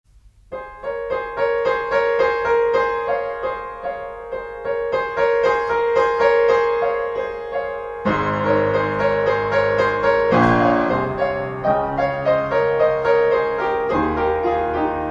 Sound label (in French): PRELUDE 19 EN LA BEMOL MAJEUR